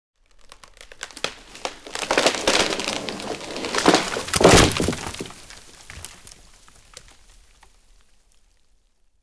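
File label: tree breaking, toppling, falling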